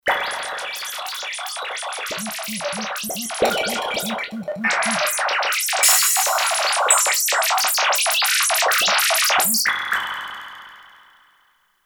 Gremlin Group, Kurzweil K2500X; Factory Programs

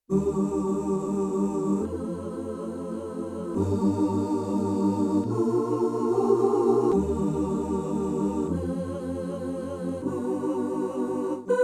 Ooo Chorus, Pops Voice, Yamaha TX16W

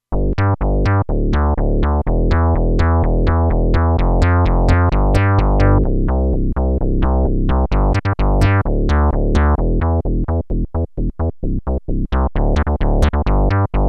Synth Bass 1, Roland Alpha Juno-2; Original Sound Chart patches

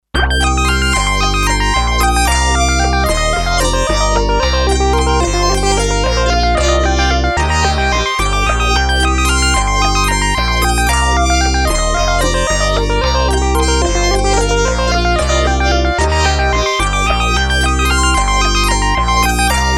Factory Sequence, Sequential Circuits Six-Trak

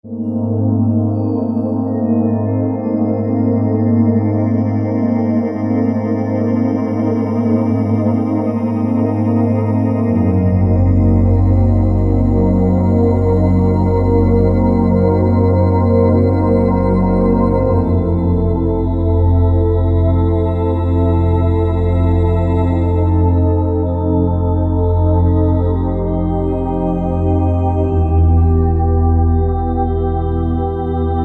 Dream Pad, synth

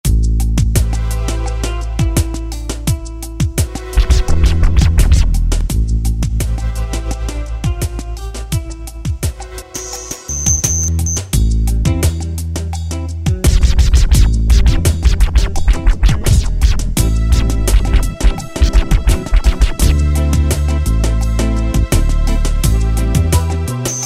Swing Hop

